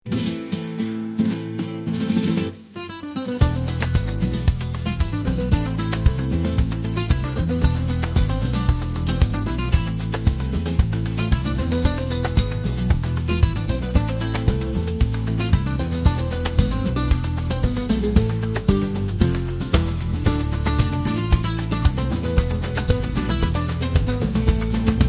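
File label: joyful heartbeat, Spanish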